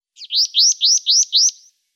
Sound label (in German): Balkanmeise Parus lugubris; Vogelstimmen